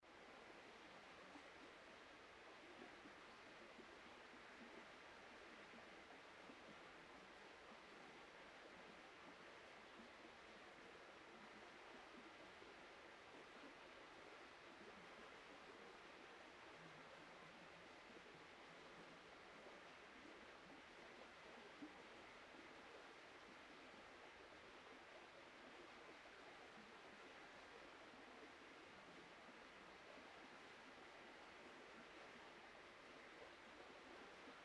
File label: Lonely Manor Storage Room